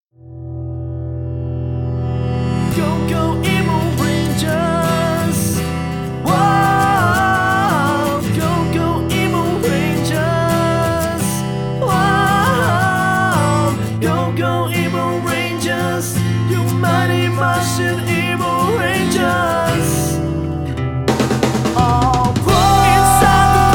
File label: acoustic